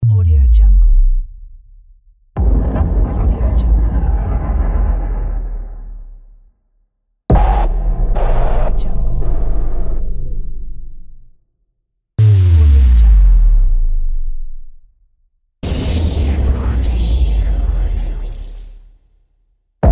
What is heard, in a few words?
boom impact pack